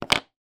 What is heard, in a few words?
office blackboard rubber duster down on table